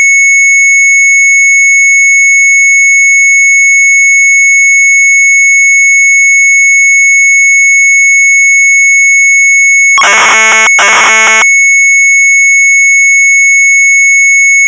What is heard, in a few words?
Sharp MZ800 Tape; retromelsarchive